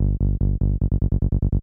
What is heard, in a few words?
303 Bass